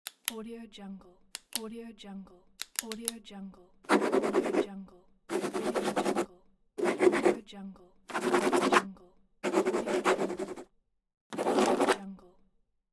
pen

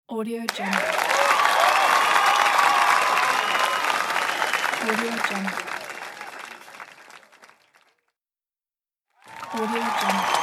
applause

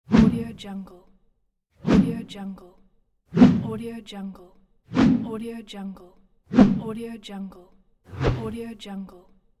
whoosh low airy

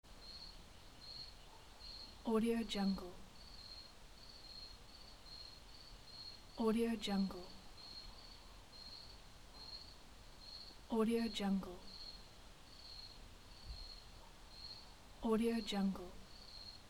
night ambience

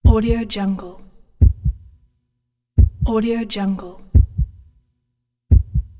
a heartbeat

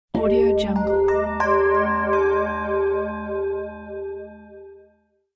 bell gong notification

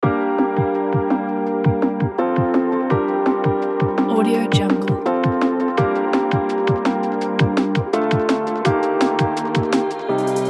ambient drum bass; hopesound

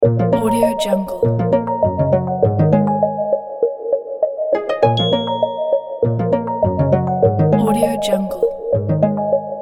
game looped background

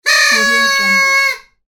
party blower

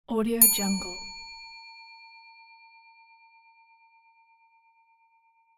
service bell; gungomer

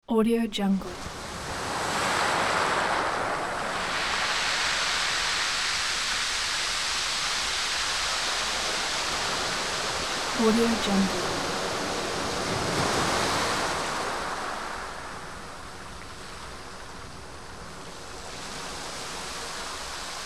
heavy ocean waves

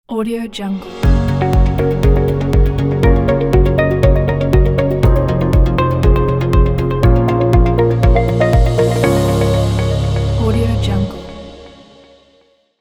logo presentation; positive-sound

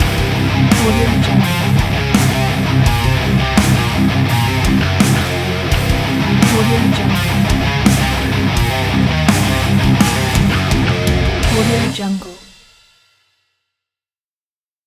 heavy metal logo

